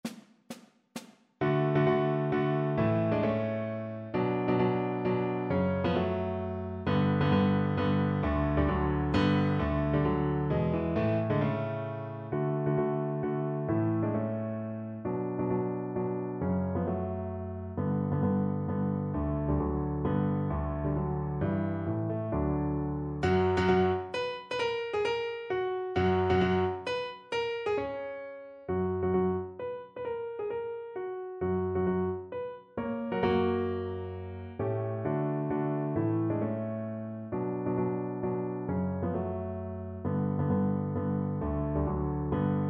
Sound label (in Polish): Mazurek F dur accompaniment for violin; Chopin; op. 68 nr 3